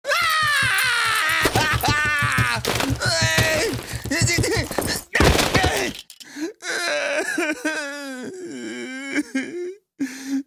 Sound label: crying; anthony fantano